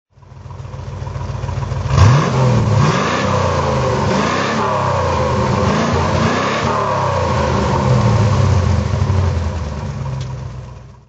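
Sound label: maserati indy throttle; Maserati Indy 4.9 Litre, 1971; Engine sound; V3D/ ClassicarImages; Marc Vorgers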